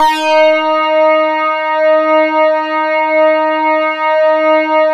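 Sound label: OB8 full blow, OBERHEIM OB8; Big Fish Audio; First Call Vintage Keyboards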